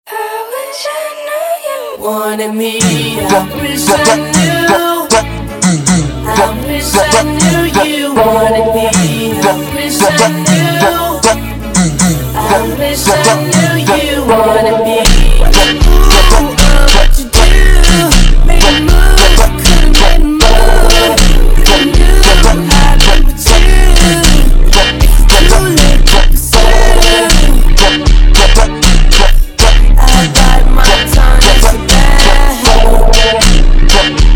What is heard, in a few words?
Funk Super Slowed